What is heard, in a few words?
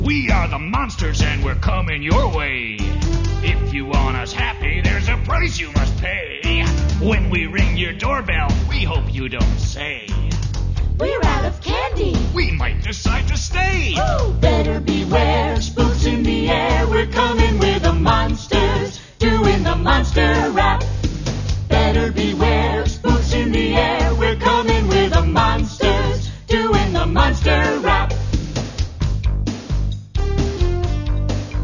monster rap